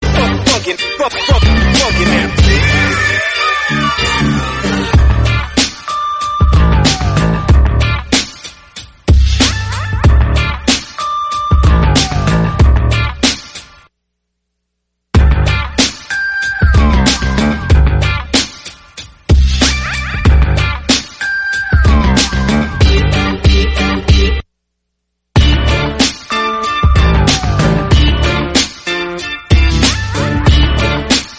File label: Instrumental